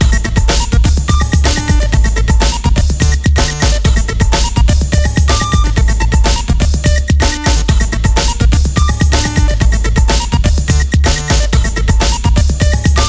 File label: Techno